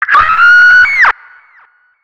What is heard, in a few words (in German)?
Schrei Frau